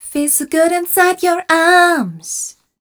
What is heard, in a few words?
f mel e flat, fem Vocals